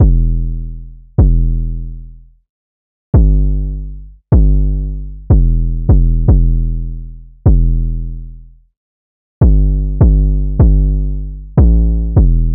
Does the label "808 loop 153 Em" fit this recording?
Yes